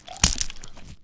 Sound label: sound Death Splat